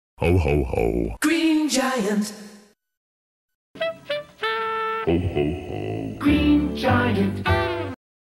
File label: jingle; GreenGiant; brand jingles